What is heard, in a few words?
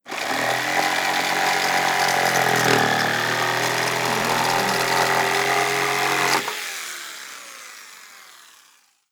sabre saw cutting old wood